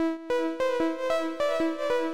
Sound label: TRANCE MISSION